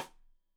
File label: Side Stick Stereo; Deeply Sampled Drums; SMDrum